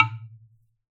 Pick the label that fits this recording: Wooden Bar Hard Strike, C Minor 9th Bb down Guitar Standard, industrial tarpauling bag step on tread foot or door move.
Wooden Bar Hard Strike